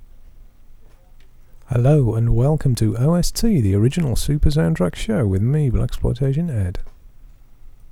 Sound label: with tube